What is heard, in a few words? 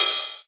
Rides, Cymbals